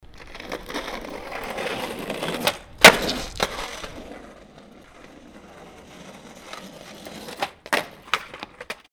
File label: skate board passing